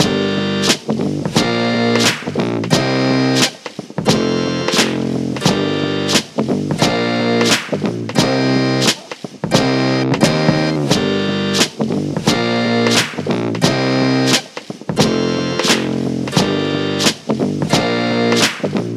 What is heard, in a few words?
loudpipes ringtone